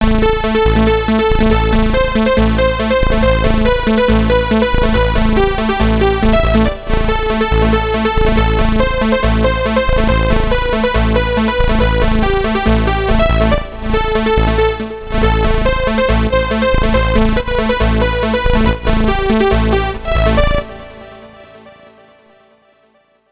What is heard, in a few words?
e-mu morpheus, sounds, transpose quality